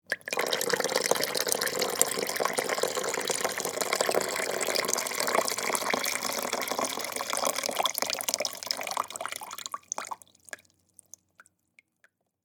Coffee Maker Pour, Household, Coffee, Maker, Pour, Water, In, Slow; Appliances; Blast Wave